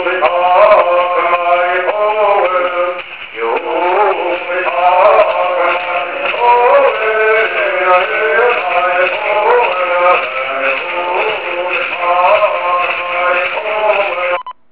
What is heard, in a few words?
Bird